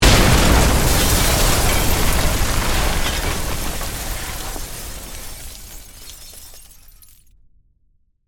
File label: Explosion Building, Crash; Warfare; Bomb Sound Effects; Explosions; Blast Wave